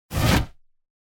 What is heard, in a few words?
whoosh master more dynamic